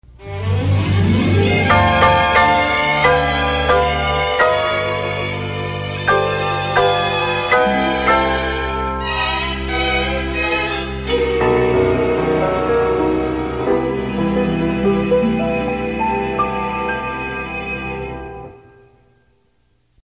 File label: Piano Signature, Sig over sweeping strings; WJPS